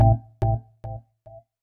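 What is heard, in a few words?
deep house FX, 125 bpm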